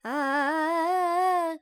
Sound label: Charukesi, Vocals, Carnatic Female, Alaps, G, Dry